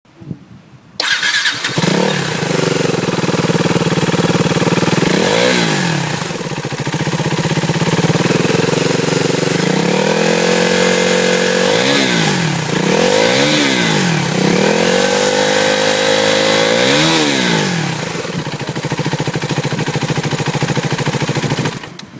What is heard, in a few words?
390 duke